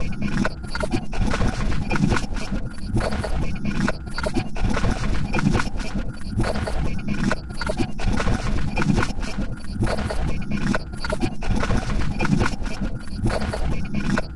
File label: Bgm